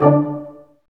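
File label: BRS Large Ensemble stac; Roland L-CD702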